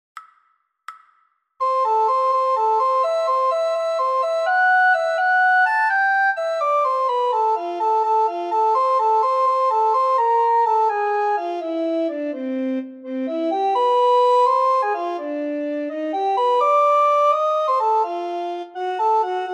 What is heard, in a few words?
descant, recorder